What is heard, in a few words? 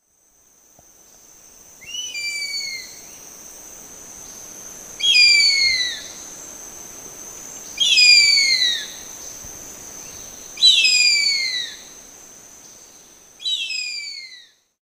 Birds of prey; پرندگان شکاری هنگام پرواز